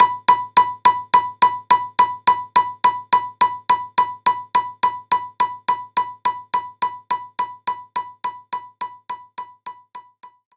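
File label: Organ Perc F sharp 2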